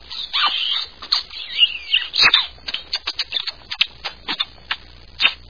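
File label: kiss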